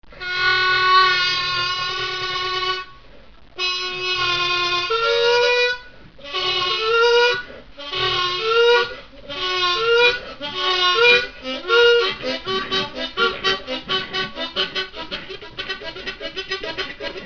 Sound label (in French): train, harmo